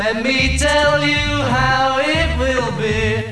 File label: imp claw